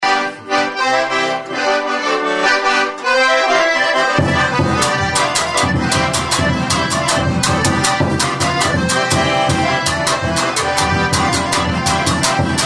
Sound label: Polka; Hurman